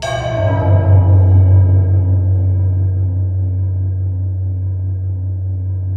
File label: space bass E; InVision Interactive; Lightware VOL-1; Instruments & Percussions; DEMBY